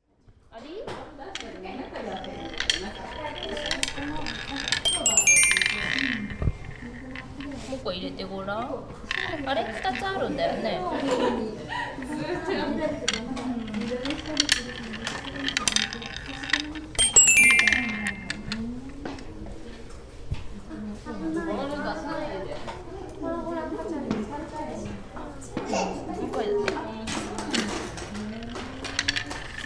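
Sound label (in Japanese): omochatoshokan beru, toy library bell; Chino Cultural Complex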